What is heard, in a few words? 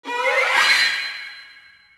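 music stinger high; Hellboy The Science of Evil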